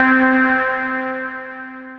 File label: Synthesizer Middle C Notes, Lead